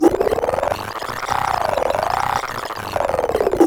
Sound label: DJ TAPE; AMG - Now CD-ROM (Roland)